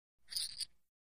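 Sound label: Poker Chip Movement; casino games; chip mvmt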